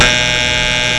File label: Door Buzzer; Soundsation